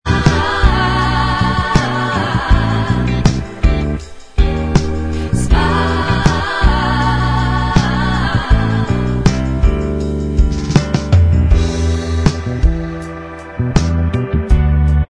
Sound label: Bvox